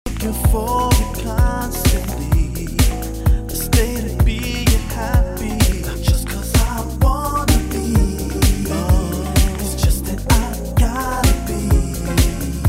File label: Vocal